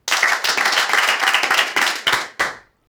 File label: premium celebration sound, applause